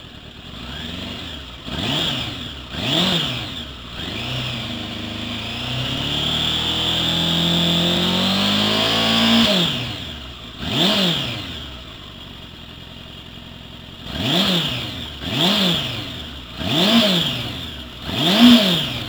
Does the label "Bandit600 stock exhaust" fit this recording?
Yes